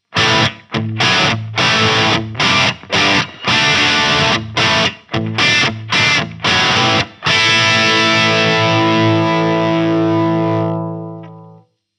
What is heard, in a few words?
Chug Minimum Gain; Solar Guitars